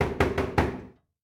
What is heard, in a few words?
door knock metal friendly; Rust